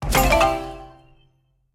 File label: explode wild; serengeti kings